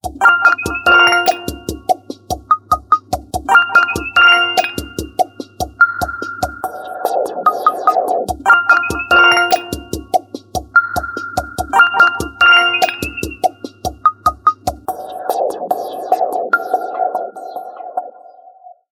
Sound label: Electronica, ringtones; Realme 8